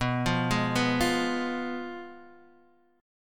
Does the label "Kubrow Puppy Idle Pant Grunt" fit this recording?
No